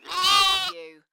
Lamb bleating; beanstalk audio

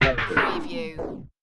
Turntable Scratching Stop Brake

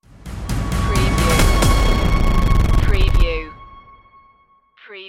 bionic animal stinger

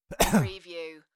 Male Cough; beanstalk audio

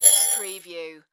Bell Ding Airy Whoosh

